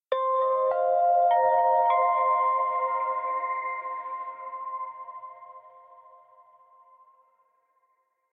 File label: Tension Fill